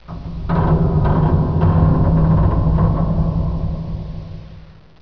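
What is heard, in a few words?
metal creak; de Nostromo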